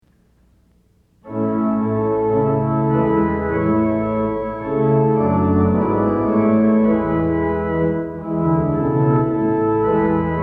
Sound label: Organ Music for Worship Services; O Praise Ye the Lord!; Sixteen Liturgy Hymns